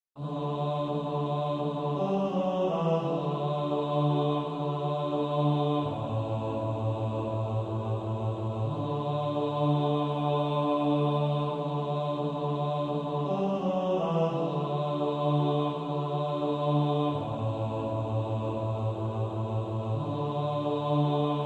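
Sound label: bass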